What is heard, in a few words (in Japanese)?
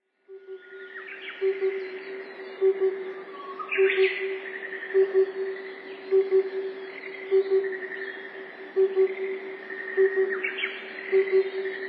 tsutsudori, nasushio